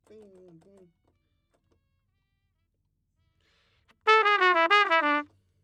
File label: Trumpet; Coles